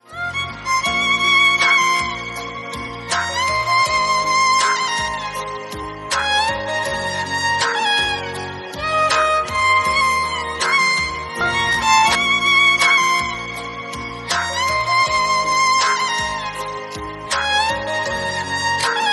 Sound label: Sad Violin Ringtone